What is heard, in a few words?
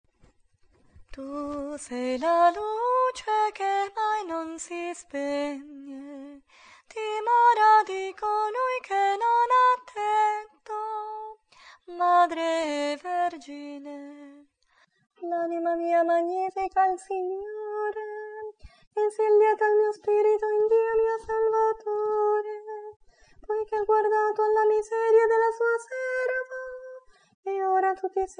Soprani e Tenori; Vitorchiano